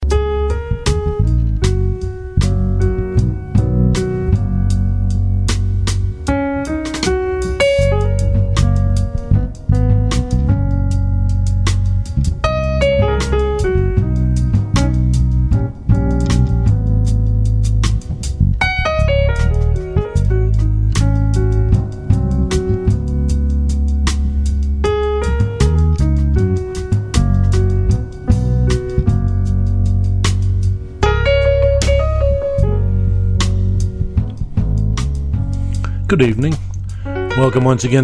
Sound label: jazz